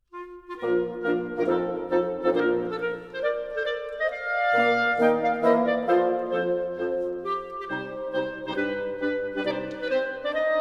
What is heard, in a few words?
March for 2 clarinets, 2 horns & 2 bassoons in B flat major; Ludwig van Beethoven; Brilliant Classics; Music for Wind Ensemble II